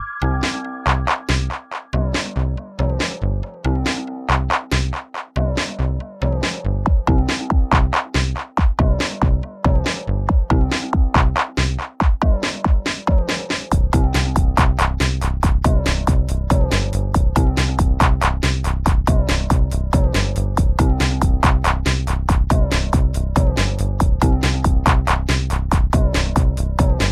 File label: Music Menu; stardrone; PS3